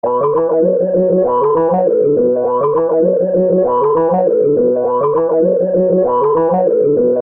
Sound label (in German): Gitarre crazy